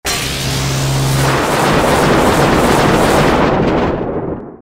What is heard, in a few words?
not fitting dragon; clash of clans